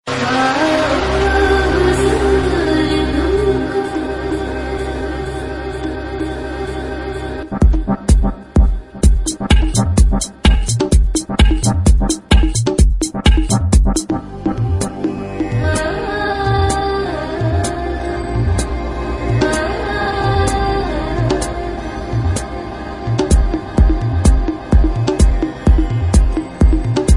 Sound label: Spirit Of Minimal